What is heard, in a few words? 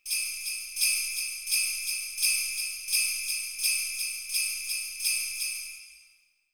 xmas suzu